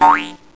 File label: bb impact